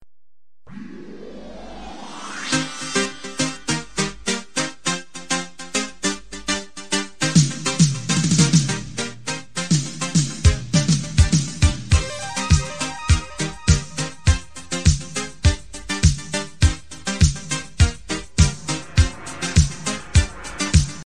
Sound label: nice ringtone